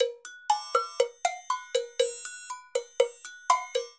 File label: Bells & Triangle; Latin Percussion; Instrument Kits; Ableton; Factory Packs